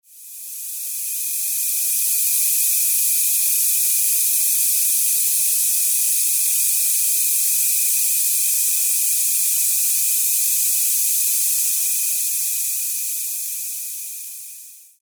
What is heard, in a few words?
Tinnitus Descender V2 with Crickets